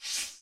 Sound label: stack move; pat & mat game; MINIGAMES; PUZZLE